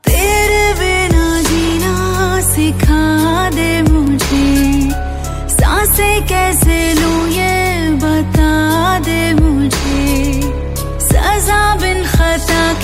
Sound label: Song Ringtone